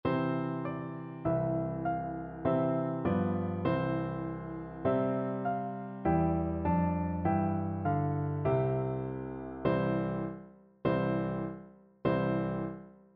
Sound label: kaeru no uta, piano duet